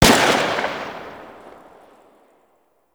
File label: Pistol Tail; Grn Kohm Pistol; Warframe; Weapons; Grineer